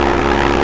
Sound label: Loop, Engine, Spitfire